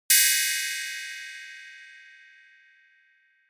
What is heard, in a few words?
Ride